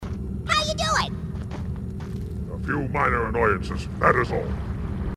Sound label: grunt; h2dialogue; grunts